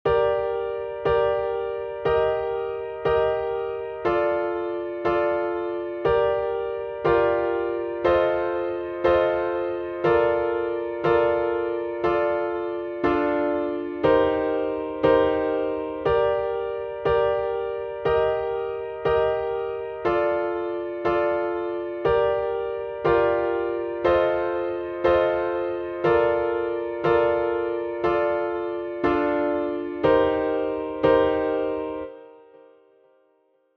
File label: Gm 7ths prog